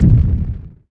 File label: Footstep; TR3; Shiva